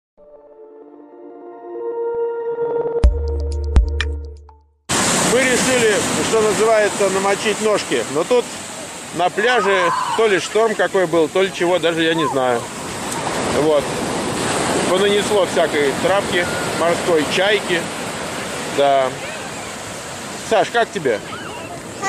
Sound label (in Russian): Chajki vodorosli sirena pozharnoj mashiny